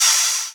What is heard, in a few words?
Open Hihat; Vengeance Samples; VEE Cymbals